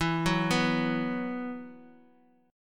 E Suspended 2nd; Esus2 arpeggiated; x,19,16,16

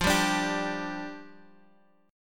F 6th Suspended 2nd F, chord; F6sus2 strummed

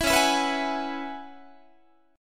C sharp Minor 11th, Guitar; C#m11 strummed

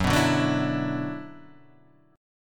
F sharp 7th Suspended 4th Sharp 5th, guitar

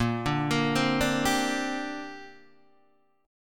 A sharp 6th Add 9th, Guitar DADGAD

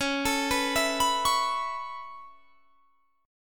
C sharp Minor 7th Sharp 5th C sharp; C#m7#5 arpeggiated

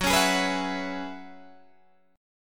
Gb 9th Suspended 4th, Guitar Open G; chordshape; down

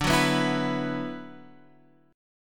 D 6th Add 9th D, Guitar, Half Step Down

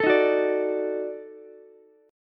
Eb Minor Major 7th Flat 5th Eb down Ukulele; EbmM7b5; strummed; JGuitar

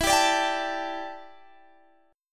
D Suspended 4th E, guitar; Dsus4/E; strummed

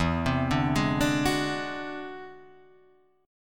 E Augmented 9th E down; E+9 arpeggiated; JGuitar

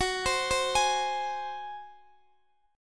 F sharp Suspended 2nd Flat 5th, Guitar, DADGAD; chordshape; down

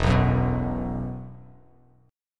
E Suspended 2nd E, down, Bass Standard; Esus2 strummed

